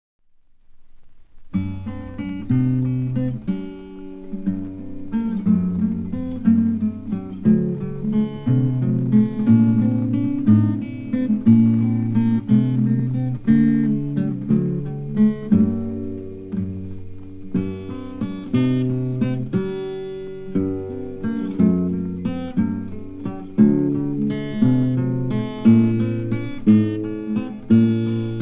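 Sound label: Gavotte acoustic guitar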